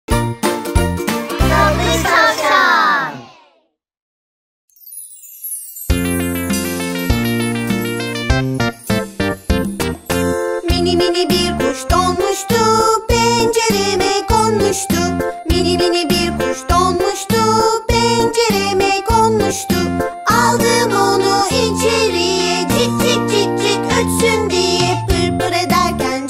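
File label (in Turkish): mini mini bir kuş donmuştu eğlenceli bebek ve çocuk şarkısı; cizgi film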